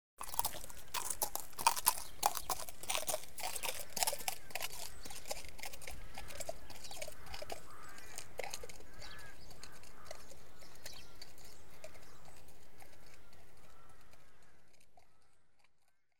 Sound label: Horse walks away on gravel path; Animals & Birds; The BBC Sound Effects Library